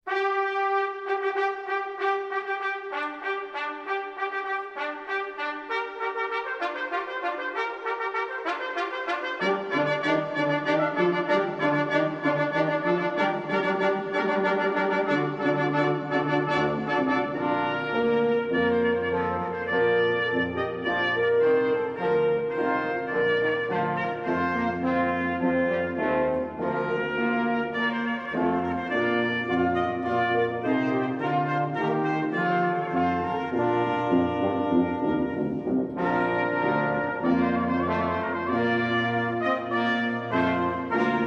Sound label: Trumpet Voluntary With Fanfare